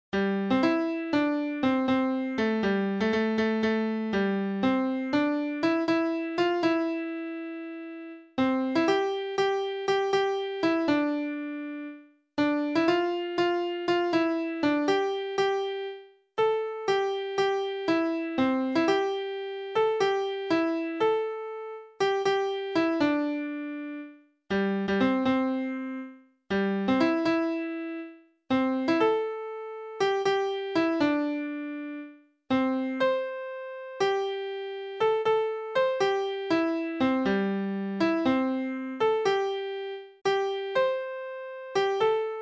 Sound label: piano